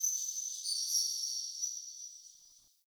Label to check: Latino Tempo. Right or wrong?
Wrong